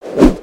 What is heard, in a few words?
hammer; aronwk collection; ndaudio